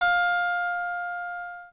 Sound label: Timer 1 sec, Camera; SKY; IM-U680L; System Sounds